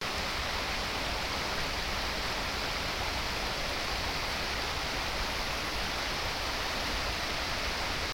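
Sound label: water flow stream of water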